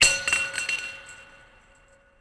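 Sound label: ambience interior clank; Tony Hawk's Project 8; XBOX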